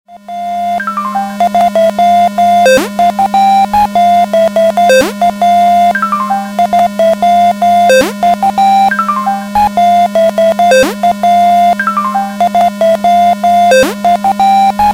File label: Clock Alert